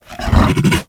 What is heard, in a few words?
Kubrow Tame Idle Alert Turn; Warframe